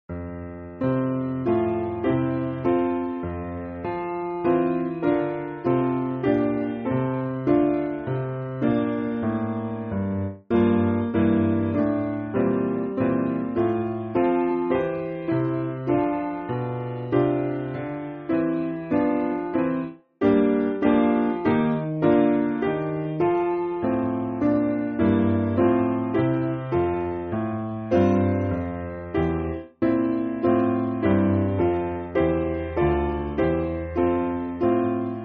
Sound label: Piano, Backing Files for Churches; O Son Of Man Our Hero; Charterhouse